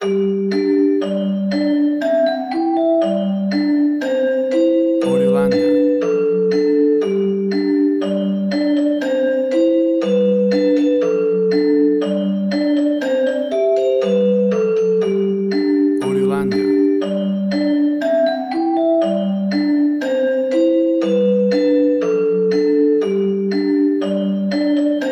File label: Baa Baa Black Sheep